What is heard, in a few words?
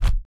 cancel, button